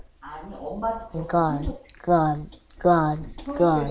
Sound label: gun